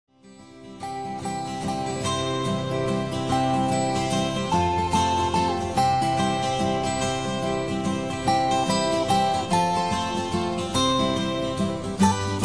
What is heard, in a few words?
Blessed Assurance instrumental, hymns